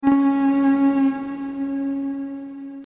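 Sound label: Alter to starboard; colregs; Alter to stbd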